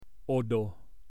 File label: Crow